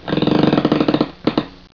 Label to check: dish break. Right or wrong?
Wrong